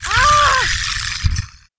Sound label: die; megaglest